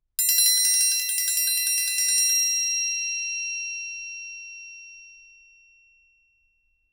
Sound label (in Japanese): トライアングル; 体鳴楽器; 打奏